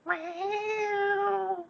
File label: meow; Text-to-Meow